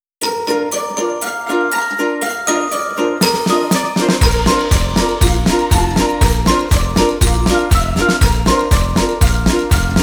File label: cheerful 120bpm; by burak sound bank; cheerful vol 10